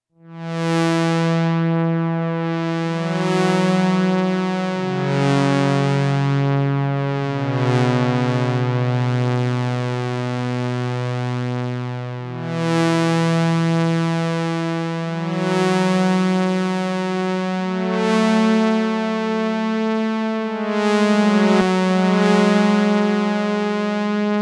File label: ensoniq sq80